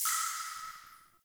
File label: Cave Ocean Drip; Midrath Island